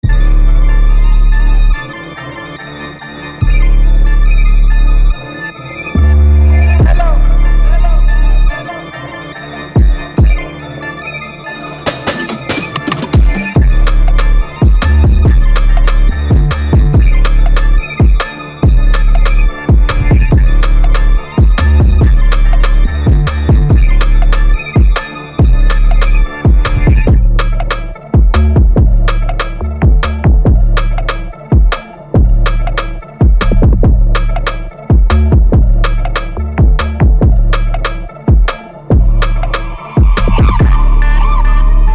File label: Sauce 142bpm D minor, beats